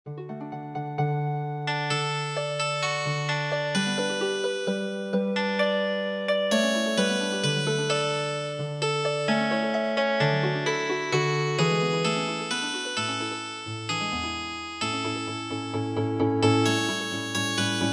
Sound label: Healing Harp, traditional; midi